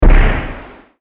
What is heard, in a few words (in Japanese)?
発砲